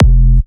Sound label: 128BPM Kit solo; Shockwave(PIL) - Future Big Room vol 2; Massive Kit